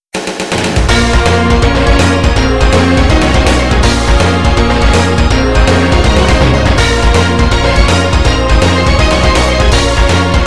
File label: Ending